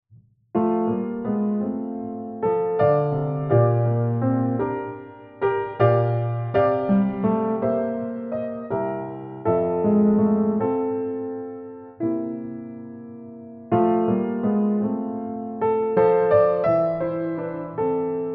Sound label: Solace Old Black Grand